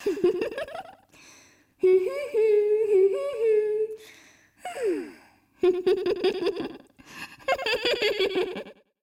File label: Singing; Midna